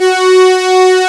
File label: OBX STRING F4; E-MU Formula 4000 Series Vol. 5; Protozoa; Vintage Presets